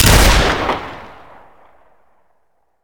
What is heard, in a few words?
double fire, dbarrel; weapons; hunger